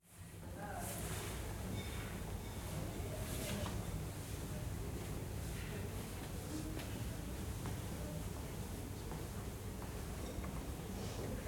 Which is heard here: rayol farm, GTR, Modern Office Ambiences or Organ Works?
Modern Office Ambiences